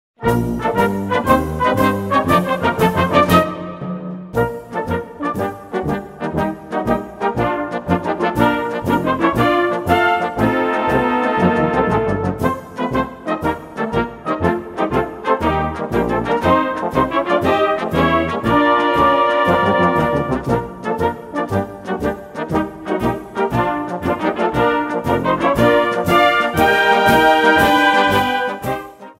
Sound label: Tarantella